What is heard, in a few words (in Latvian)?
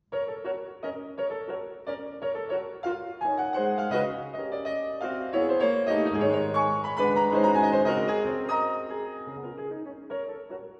Mazurka Ges dur